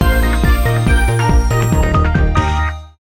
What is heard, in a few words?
Banner Jingle; Chibi-Robo! Zip Lash